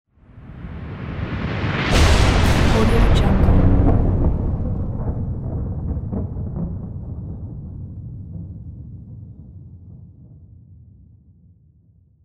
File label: artificial thunder